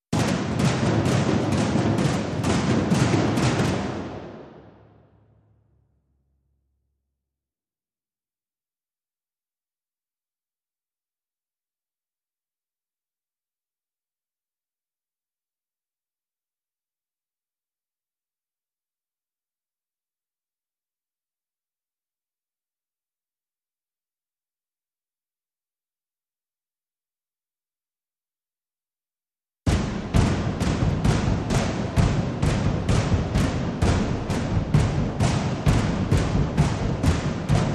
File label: Loop Layer Toms